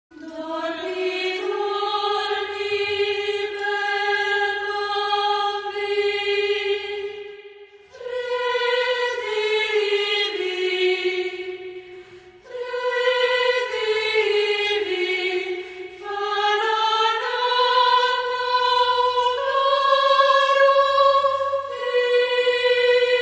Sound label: Choir